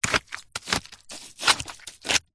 wood stick poke into sludge wet mud then movements; foley